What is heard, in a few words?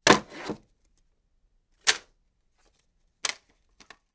Frame Set Up; Foley